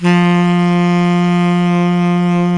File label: SAX Tenor Breathy F 3